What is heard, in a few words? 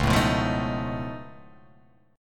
Db Augmented 9th, down; strummed; JGuitar